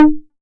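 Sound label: 808 mid conga Tape Sat, Tape 808, Conga; Goldbaby Tape808 & Tape909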